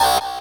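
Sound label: Rave Synth, Dance Mega Jungle Rave; 90 s Sample CDs; Best Service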